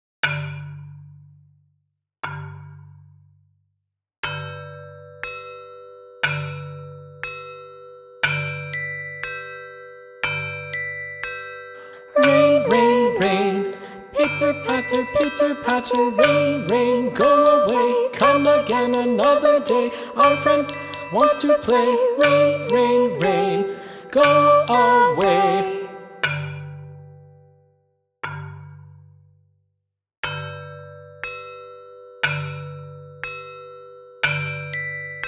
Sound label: Rain Rain Go Away vocals